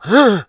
Grunt; TR3; Playable Tutorial Level

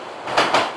Vehicle Minecart Track Loop; Playable Tutorial Level; TR3

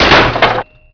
Vehicle Impact; Playable Tutorial Level; TR2

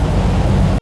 Aircon Loop; Playable Tutorial Level

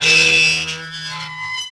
Metal Gate Open; Playable Tutorial Level

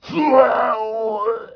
Henchman Death; Playable Tutorial Level; TR2

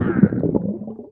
Scuba Diver Death; Playable Tutorial Level; TR3

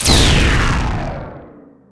Fire; TR3

